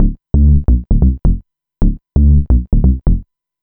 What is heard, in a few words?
bass, dance; Basses